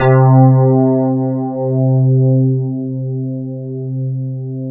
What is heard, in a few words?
T3 E-PIANO C; KEYS; Alpine Volksmusik